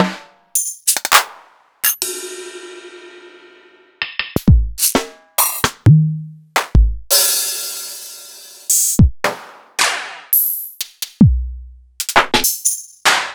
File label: EMU Orbit